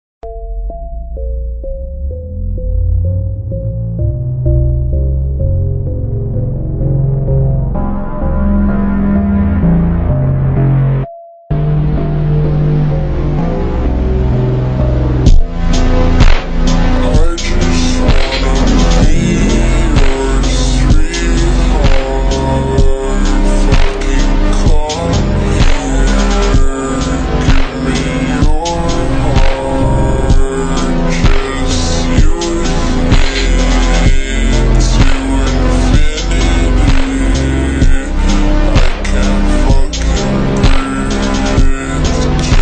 ultra slowed reverb

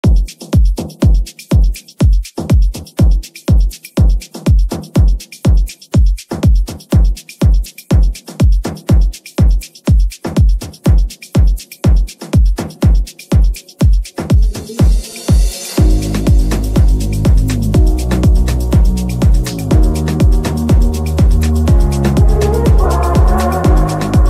afro house remix

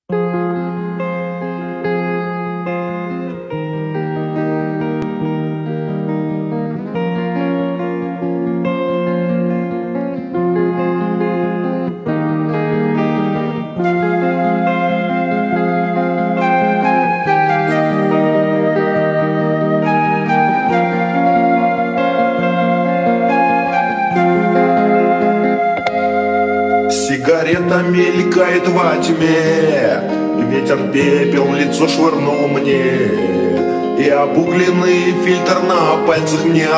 slowed reverb

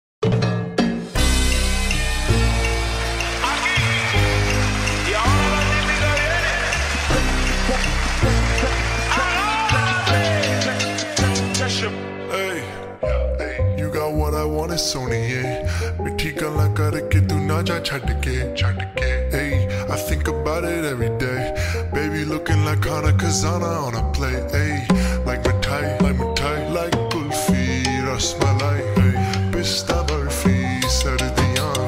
slowed reverb

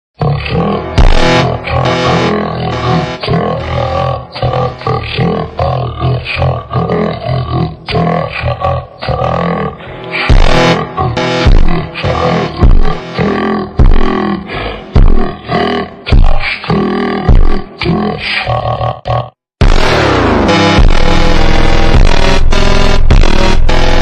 ultra slowed reverb

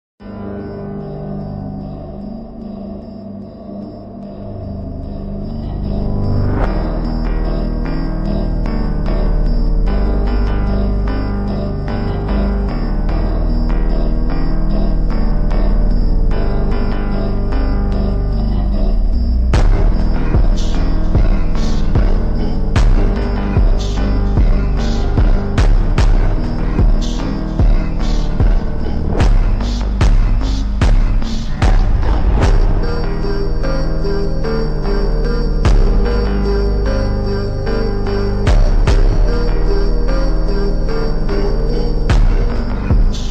super slowed reverb

